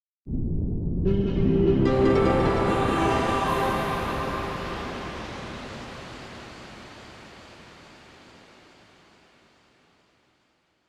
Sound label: Space Jingle; AGameAWeek